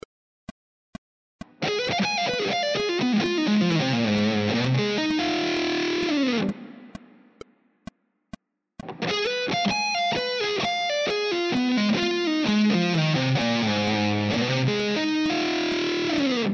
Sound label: outside lines